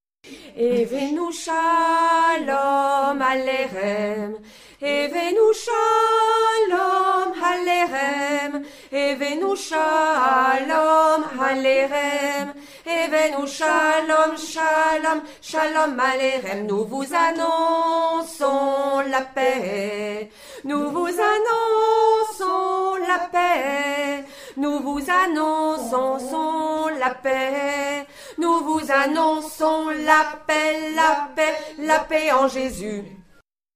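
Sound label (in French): Evenou Shalom Alto Tenor Basse, chœur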